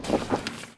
Sail Up; Civ4 warlords

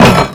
knife wood; Call of Duty Modern Warfare; physics

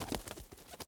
flap wings short; Rust

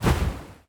ship engines; World Of Warships

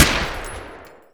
Automatic M249 End, Weapons; DCS

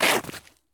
stop asphalt; Escape from Tarkov

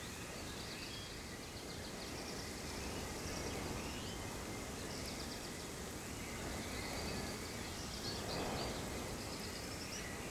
ambience forest day breeze dry; Rust

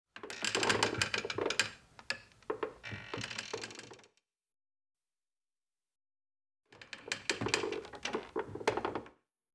wood creak light

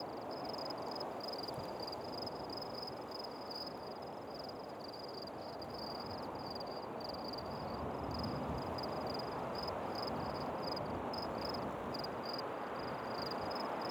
ambience soft wind forest night; Rust

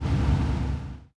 engines; World of Tanks

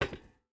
footstep boots metal walk; Rust

